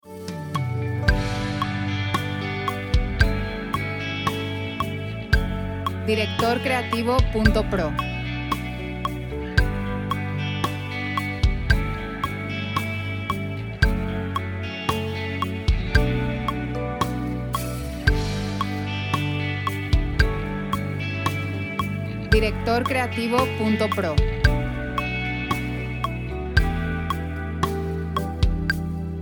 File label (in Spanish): 56.5BPM, 4/4, D